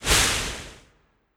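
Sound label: Hydra poison; legend of keepers gamerip 2019; Hydraposion1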